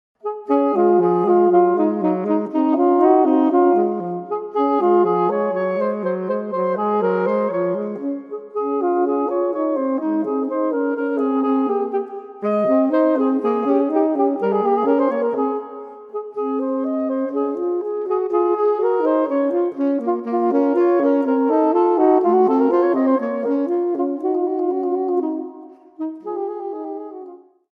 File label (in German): alt tenorsaxophon; hofmeister verlag